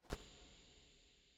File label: Mountain Beat Machine Rock Catch